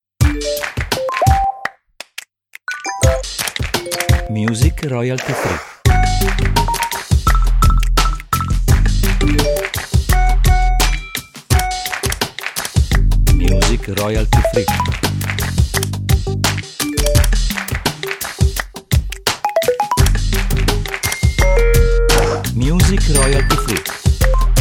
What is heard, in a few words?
Hip hop